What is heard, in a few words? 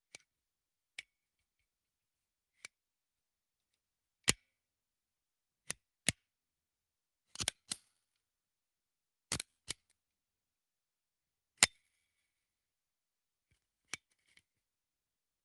mech stappler; Sonniss GDC